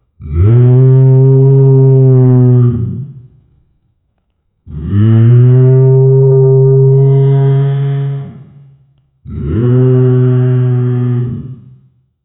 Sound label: lion roaring sound; animal call